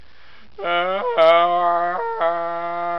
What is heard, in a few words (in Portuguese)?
lhama cantando